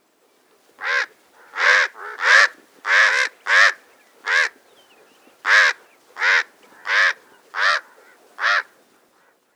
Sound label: Chihuahuan Raven Call; Rabenvogel